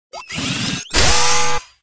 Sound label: Emote Error; Mini PEKKA